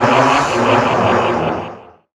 weird; Best Service - XXL Production Effects

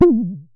Synare Filter, Star-Instruments Synare-3

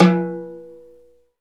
PRC TABLA; Northstar - Drumscapes Roland; Bonus Perc; Timbales

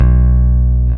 E bass (B); Sonic Foundry (Sony Creative Software); Ugly Remnants VOL-2; Basstuff

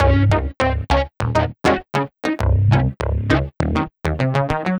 Clav Bass; Sonic Foundry (Sony Creative Software); Synchro Funk

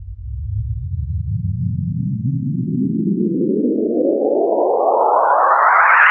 Rise FX C3, Club Techno; Zero-G

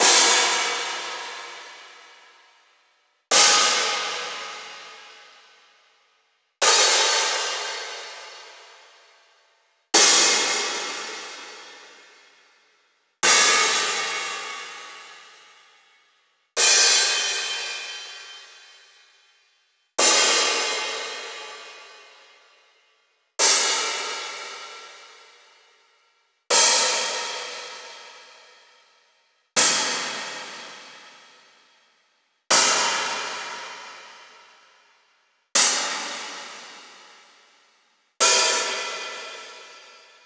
Open Hats Acoustic Open Hats; ghosthack

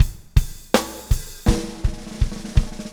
BUGA, BUGABOO

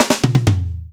Fills 128BPM, Clubsounds

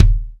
XV Kick, Roland XV-5080

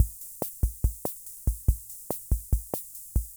Keynote MR-101 Auto Rhythm Loop; Keynote MR-101 Auto Rhythm Sample Pack

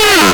Synths G#; Vengeance - Essential Clubsounds Vol.2

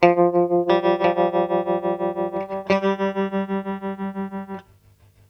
MELO, GTR LOOPS; USB Soundscan vol.31; Miro s Grooves; AKAI

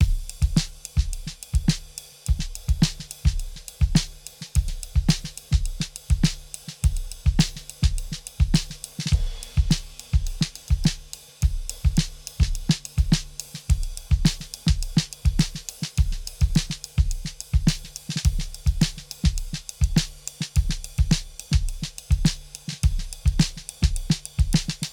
Flat Ride 105 bpm, drums; Basement drums vol 1

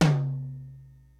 LIVE AC KIT, DRUMS; Kid Nepro; Elite

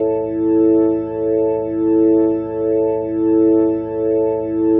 Single Note A1, Organ; Loops; Single Notes; Sonic Foundry (Sony Creative Software); Joe Vitale Organ Donor

